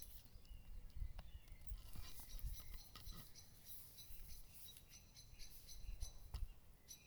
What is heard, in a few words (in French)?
Serotine commune Eptesicus serotinus; carnet nature ete